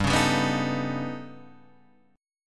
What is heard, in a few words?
A 9th Flat 5th G, chord